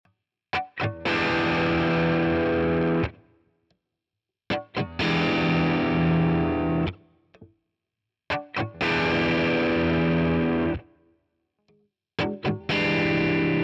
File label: Nashville Tele Push Pull Overdrive; Fender PP